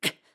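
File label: Hurt, voice; Link getting hurt; BOTW; Breath of the Wild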